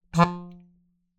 Melodica Short Note

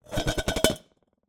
Ricochet, vase

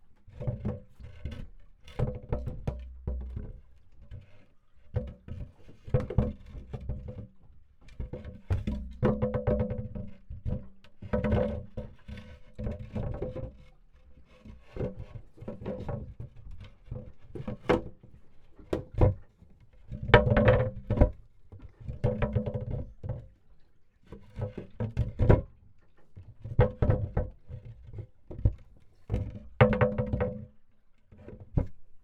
Sailing

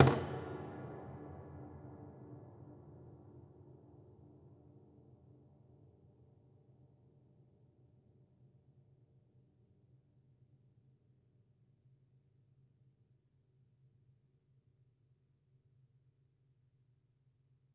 Body Thump Ped, Piano

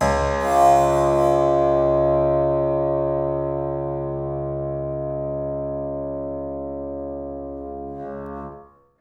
String Pluck Buzz, piano; Piano Miscellaneous

